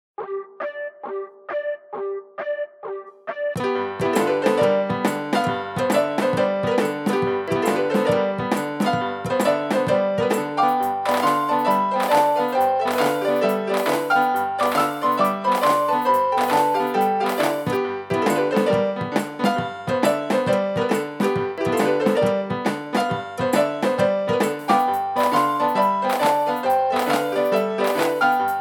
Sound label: boogie oogie jazz